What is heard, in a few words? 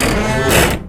cart explode falling; Source Engine; tf